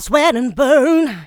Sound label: Female Vocals; Houseworx